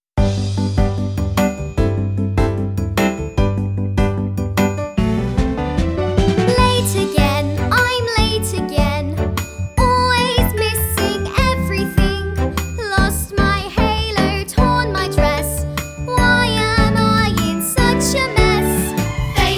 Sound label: Vocal; Sunday School Pageant 2025